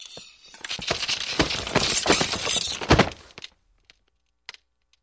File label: Ice Break Compilation